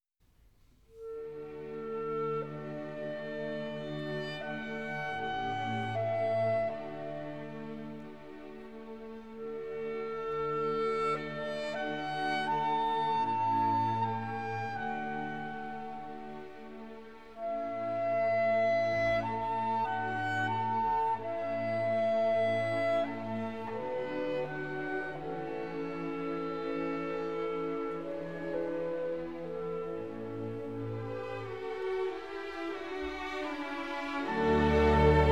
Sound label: clarinet concerto's second movement; Mozart; Legend of Galactic Heroes; Galactic Empire SIDE